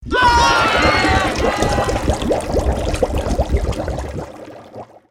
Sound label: ship sink; ambience